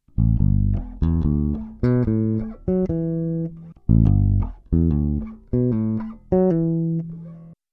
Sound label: Electric Bass Pull-Off; Electric Bass Pull-Off Example